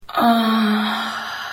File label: orgasm conglomeration, A conglomeration of many orgasm sounds; my friend in wonderful Spring, TX gave to me